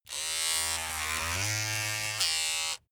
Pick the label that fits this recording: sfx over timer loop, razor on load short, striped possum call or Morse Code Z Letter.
razor on load short